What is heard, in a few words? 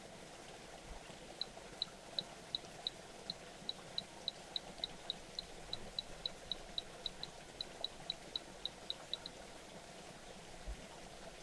P arcanus call